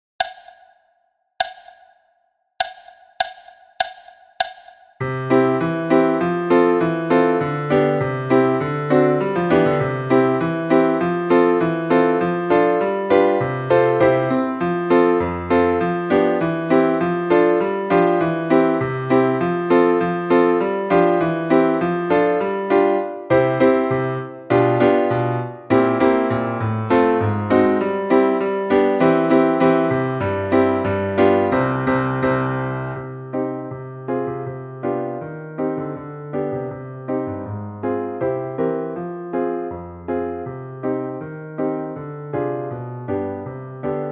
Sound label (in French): piano a 100 bpm